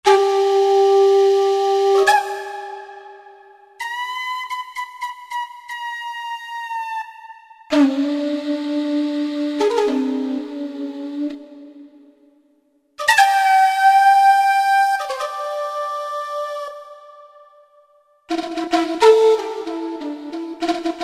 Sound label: Shakuhachi; Factory preset demos